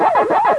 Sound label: eating, pacman